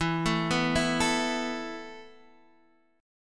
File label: B 7th Suspended 4th E